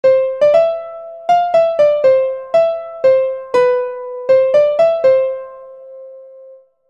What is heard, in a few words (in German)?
melodie